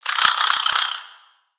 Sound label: Spider Dazed; Lego; creatures mechanical